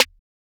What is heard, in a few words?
Snare, trap drum kit; MZ BEATS - FREE TRAP DRUM KIT